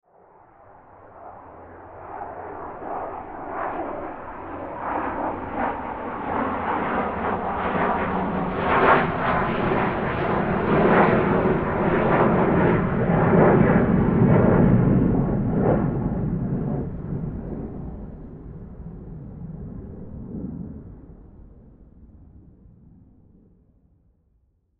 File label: Jet Commercial Airliner Fly By Overhead; Transportation Aircraft; Airplanes